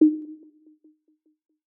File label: app ui sound; UI Sounds; achievement; android